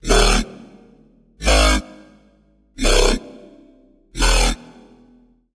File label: Wobble; Wobble - Reverse The Dark; Routed Sound; Serum 2 Expansion - Chaos Bringer Vol.1